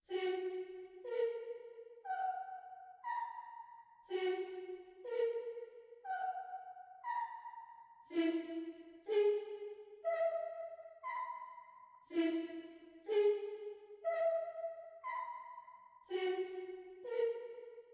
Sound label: Space 2 choir